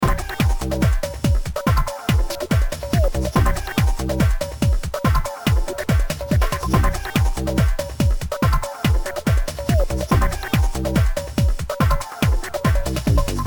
Techno; Color Cross; nds gamerip

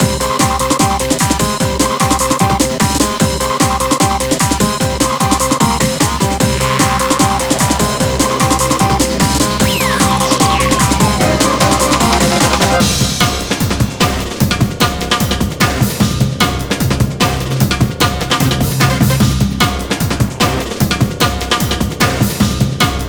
Space Wub; Monster Shooter 2 Back to Earth

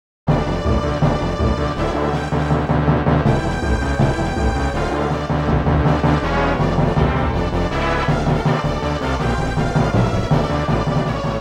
Requiem in D Minor K.626 Dies Irae; Super Famicom Magazine Volume 19

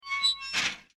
Close Gate Cellar

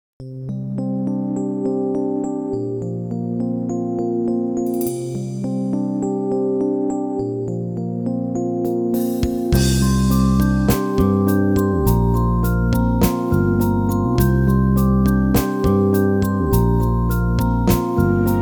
Silence; Kao no Nai Tsuki

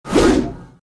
gui card flip; Idle Champions of the Forgotten Realms

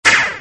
hit sound

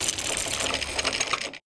cylinder inserting

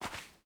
Footstep Sand

